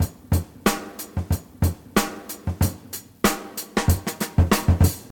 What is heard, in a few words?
93 bpm drum beat f key, kick tuned to the F note